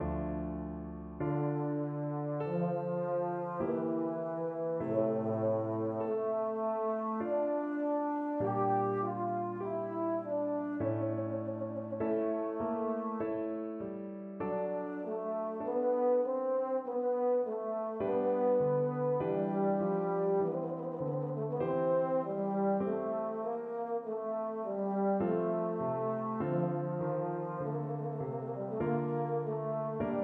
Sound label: EUPH, euphonium